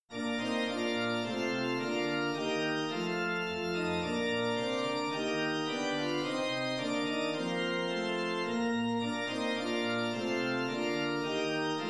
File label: arr for organ; salve regina coelitum